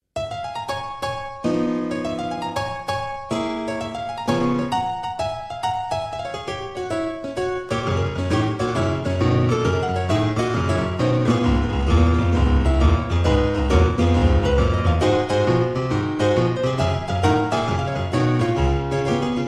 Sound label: piano